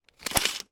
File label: industrial tool tape measure pull short length out